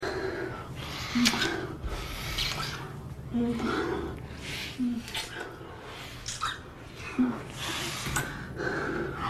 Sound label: kiss; addams